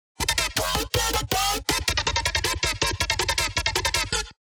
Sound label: wobble